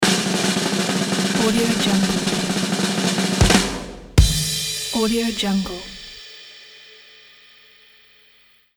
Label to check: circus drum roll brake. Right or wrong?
Right